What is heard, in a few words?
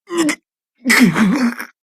sweat